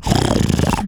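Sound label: dragon ambient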